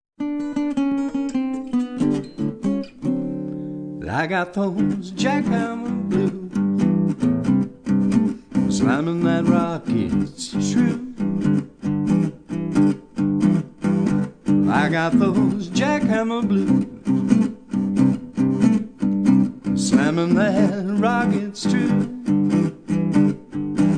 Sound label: jackhammer blues; acoustic lead guitar